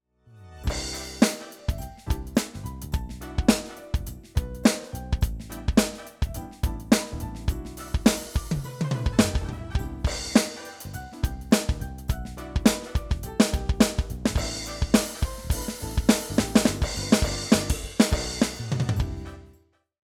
Millenium MPS450 Backing

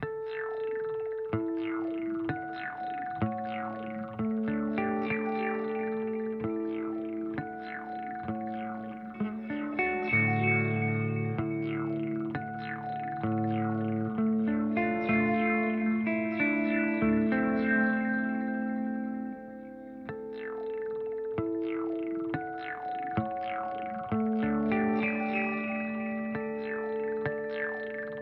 Boss GT-1000 Core Reverb Terra Eco; Vergleichstest

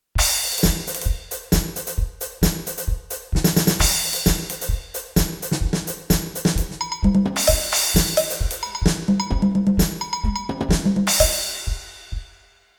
Percussions

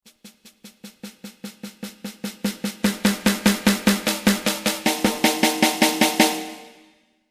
Snare

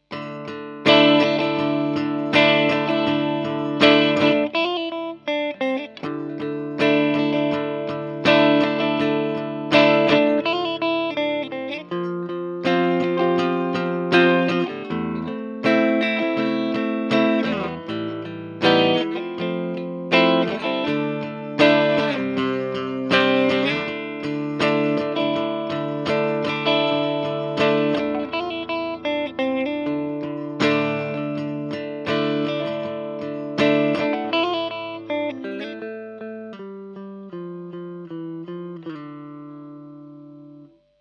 ESP MH 400 mid clean

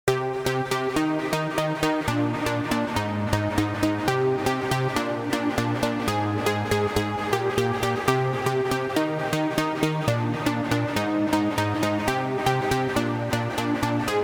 StabBreakdown

